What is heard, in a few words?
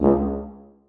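tuba staccato c#3; Sonatina Symphonic Orchestra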